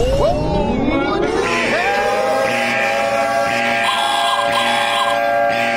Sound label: what the hell omg no way air raid goofy ahh; blyat mellstroy